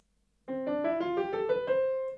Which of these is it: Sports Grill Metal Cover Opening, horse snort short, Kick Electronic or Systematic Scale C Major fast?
Systematic Scale C Major fast